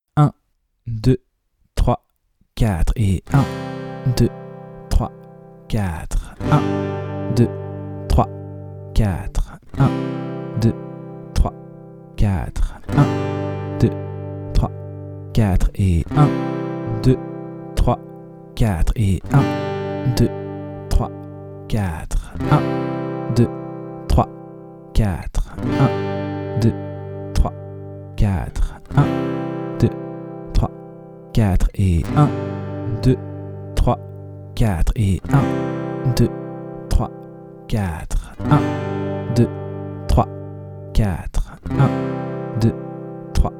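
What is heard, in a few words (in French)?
moyen Passer de C à G, suites d'accords; De 0 à vos premières suites d'accords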